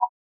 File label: hover; junisaber